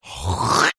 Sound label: human male hack